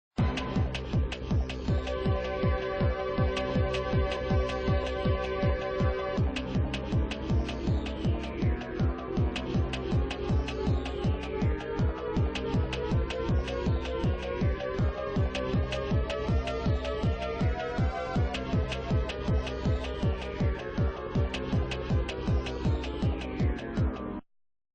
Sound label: Warp Tunnel; Battlespare; Atari Jaguar